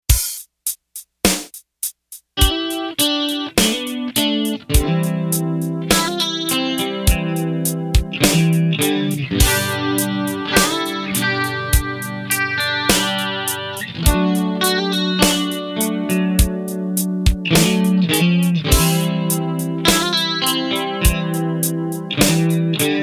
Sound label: pop clean delay chorus; vox